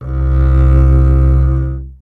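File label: string contrabass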